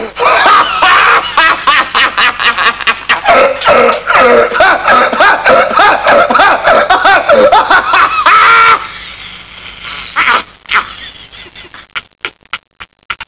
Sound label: laughing